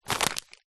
seeds packet black chia pick up; food

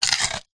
horror creature single bite crunch large

